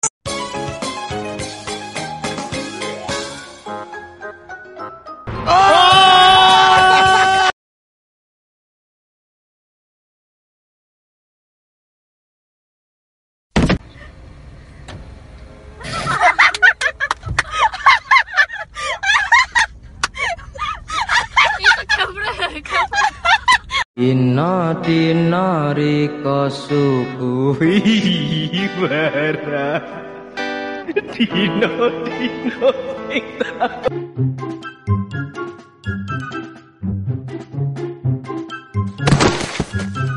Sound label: laugh; breadradio